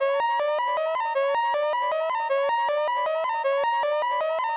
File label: mind control wave loop